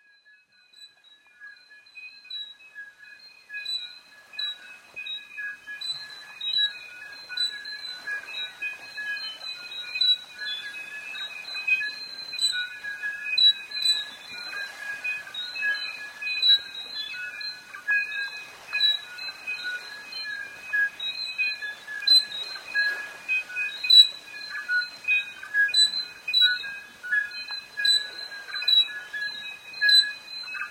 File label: birdsong chorus